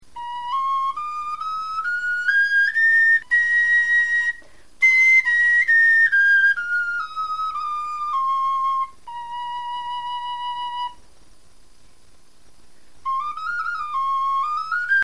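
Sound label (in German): tonleiter, Knochenflöten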